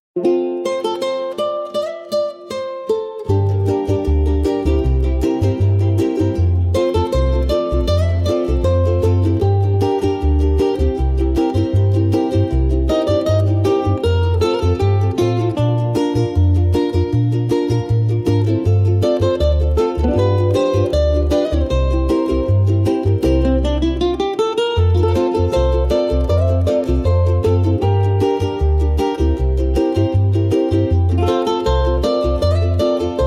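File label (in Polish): Muzyka Hawajska; Mele Hawaii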